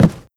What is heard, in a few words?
step run, footsteps cardboard